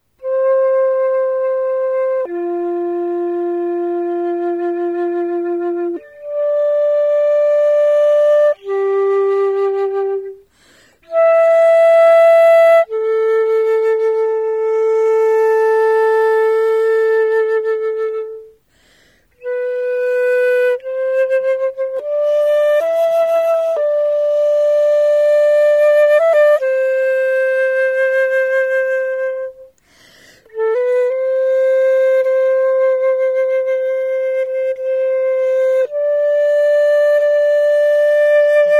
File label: flute